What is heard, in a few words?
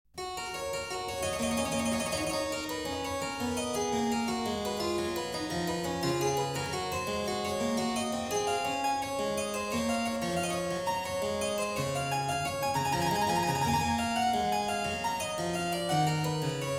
Prelude in F-sharp major, clavier; Prelude & Fugue No.13; BWV 858; BACH; WELL TEMPERED CLAVIER; BOOK I